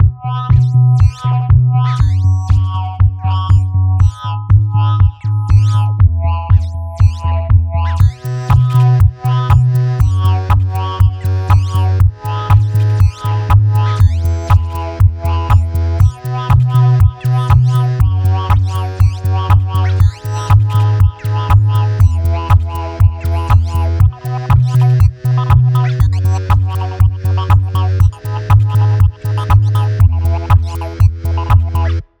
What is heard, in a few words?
Sine Wave